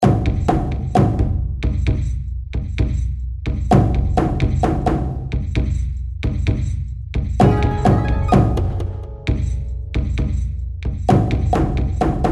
Ambiance In Game

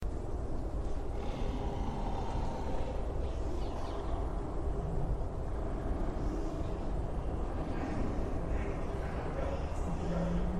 Bestiary Amb; shieldwall chronicles swords of the north

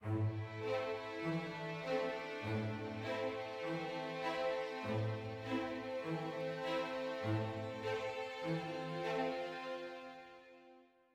adventure med start

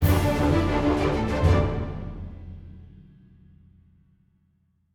Music Fight Pirates Theme Average Bpm128 End; asterix obelix slap them all 2

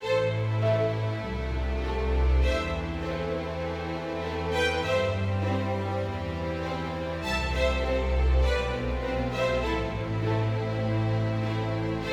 adventure forte; Quivr; PS4 Windows gamerip 2016

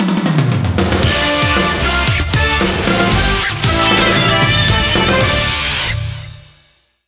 fanfares left; nfl blitz 20 03 gamecube

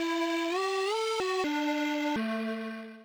Door Open (Fanfare); Bionicle Matoran Adventures

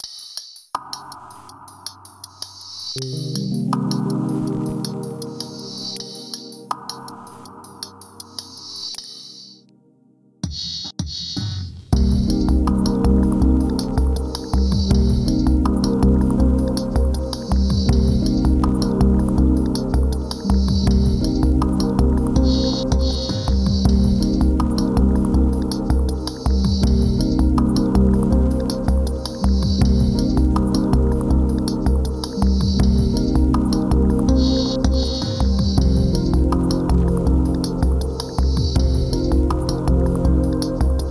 Underwater; I Am Ball

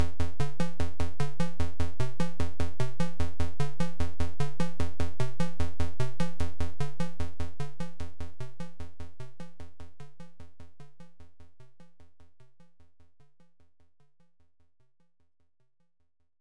Main Bgm

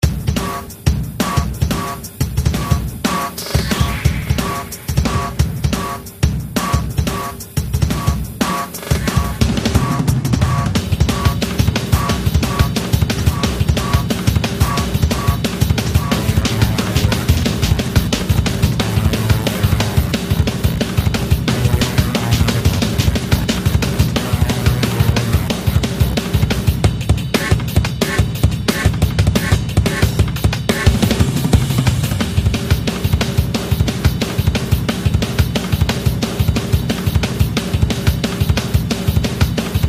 Drums & Fx

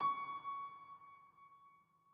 Env Field Day Ground

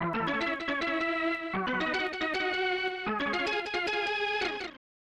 Baseball Fanfare; Ken Griffey Jr. Presents Major League Baseball; snes

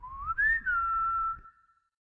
Whistle; Fling a Thing; Android

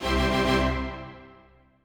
Lv Up; Shattered Throne

